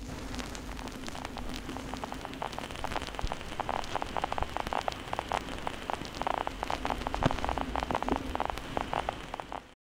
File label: Vinyl Warmth; FX; Cymatics - Humble Hip Hop Sample Pack